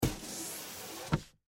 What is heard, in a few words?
Drywall Sheet Scrape; Construction; Industry; Blastwave